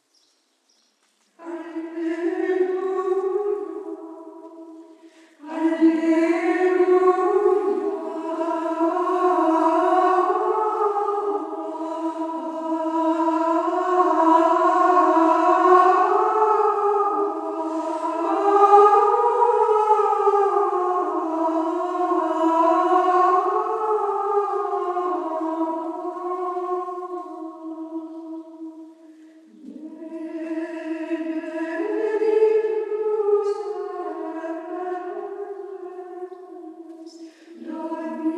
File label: Alleluia Benedictus es; sainte trinite; argentan